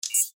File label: subtle tech interface